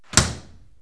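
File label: metal door; KABOOM! - Wild and Wacky Sound Software; house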